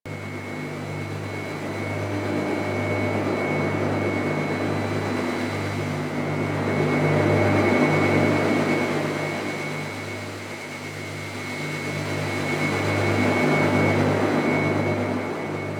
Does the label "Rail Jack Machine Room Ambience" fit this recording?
Yes